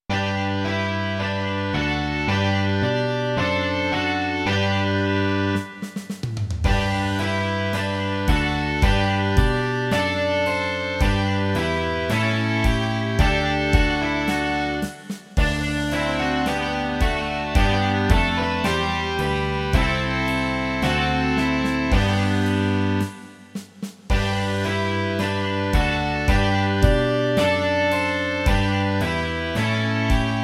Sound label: rock